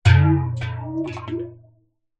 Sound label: industrial metal canister large containing water hit bang against object